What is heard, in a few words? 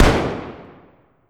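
weapon lmg fire player atmo int; gmod; viper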